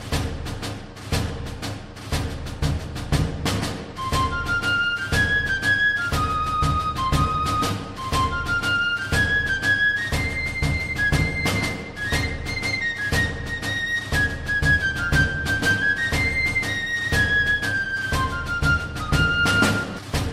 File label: march